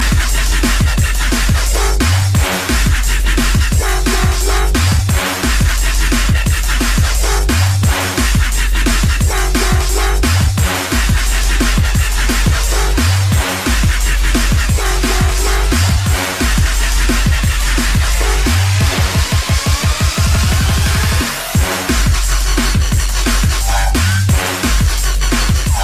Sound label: dub step, ringtones